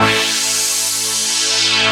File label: Pad, Synthesizers